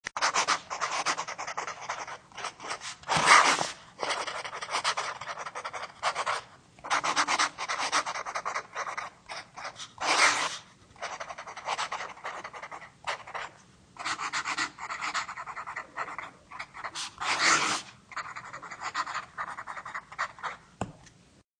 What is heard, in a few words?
Writing with Pencil, Scratching sound of writing with pencil; Human